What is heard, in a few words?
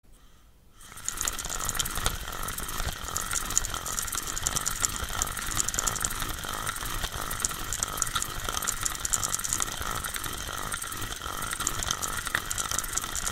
Fishing